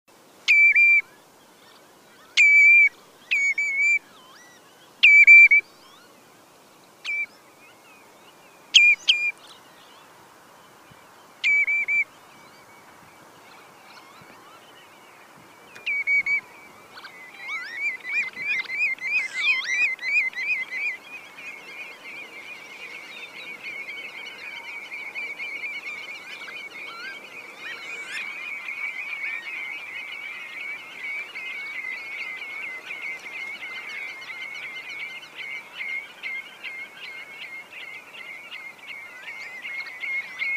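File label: travnik golos, common redshank voice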